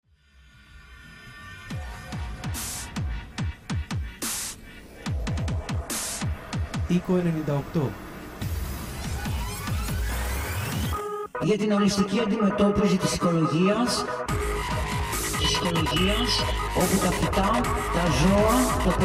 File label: dubstep